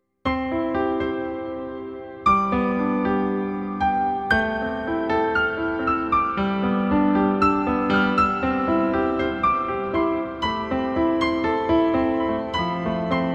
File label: piano play